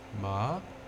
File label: tone